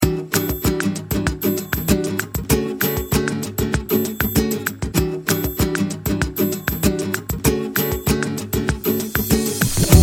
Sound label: latin soul; latino